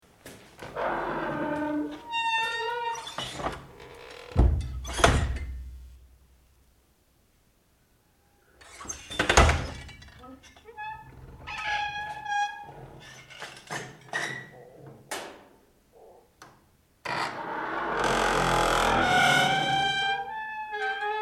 ouverture de porte

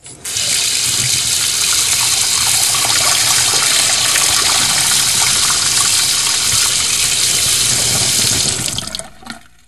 robinet evier

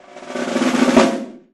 roullement tambour

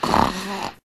ronflement

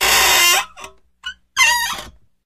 chaise bureau

